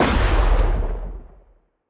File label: lightning muzzle lightning crack, weapons; kaiju; Stellaris; biogenesis